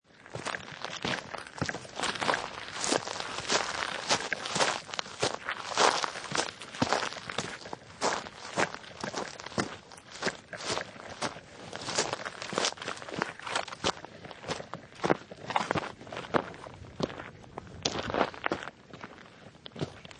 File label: Footsteps On Leaves, Walking on forest path or country road, dirt ground wi; dirt ground with dry leaves and twigs